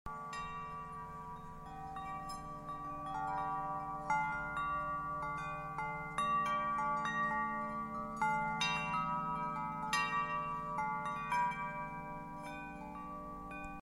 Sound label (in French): son carillon 142cm